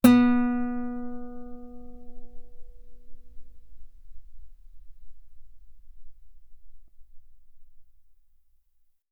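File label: Guitar ff B string B3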